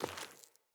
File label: Sandals Walk Rock; Player; Footsteps